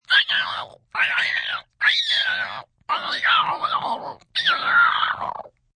Aliens Squealing, Alien, squealing, pig-like, in pain (human voice); VoiceBox